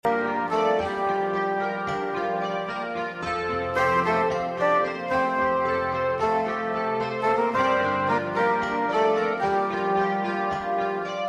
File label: summer sun set light music tone ringtone